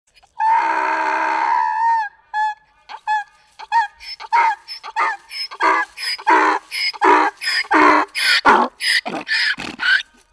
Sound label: craazy donkey